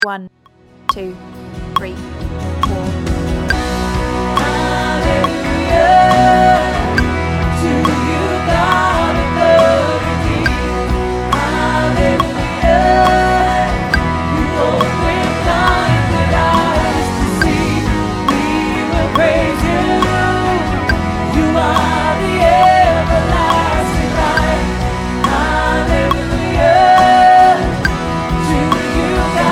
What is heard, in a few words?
soprano